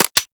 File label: Laser Aim Rifle Alt Mech; Warframe; Grineer